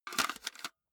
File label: cassette into walkman